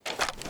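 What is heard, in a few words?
weapon foley m44 reload lift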